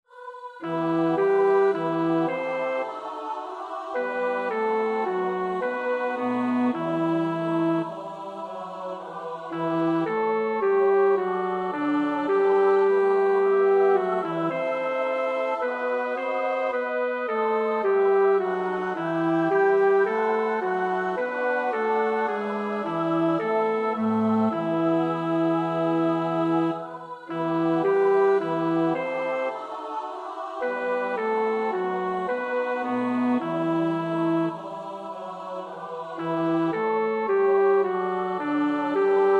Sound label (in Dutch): bas, koorpartij oefening; Bach (Johann Sebastiaan)